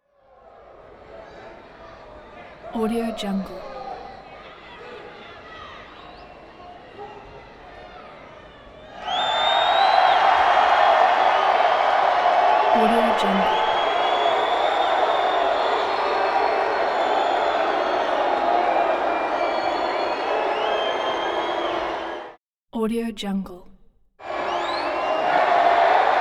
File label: wrestling crowd